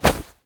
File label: fire; CosmicRage; combat